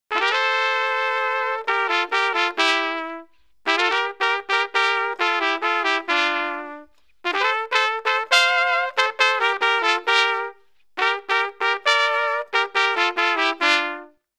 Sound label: Ringtone; Mariachiman